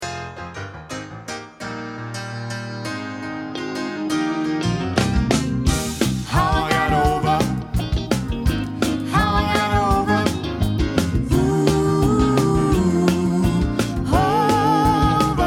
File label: Alt bas